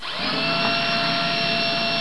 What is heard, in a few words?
saw spin, weapons